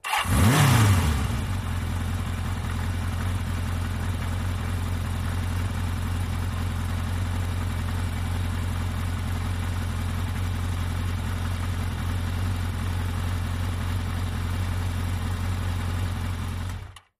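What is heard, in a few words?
Motorcycle, Kawasaki 750, Start Close Up with Rev, Idle, Off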